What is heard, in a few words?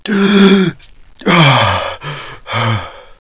male gasp; jcdenton